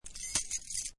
Key Ring Toss In Hand, Keys on a key ring, toss up and catch in hand; Jangling